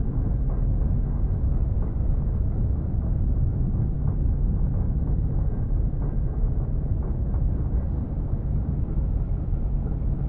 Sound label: ship battleship idle; aquatics